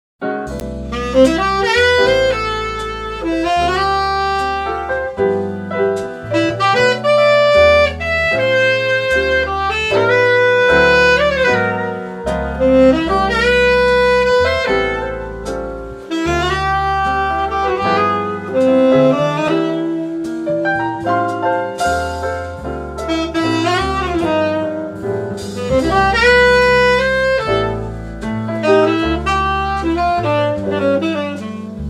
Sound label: Jazz Quartet; jazz band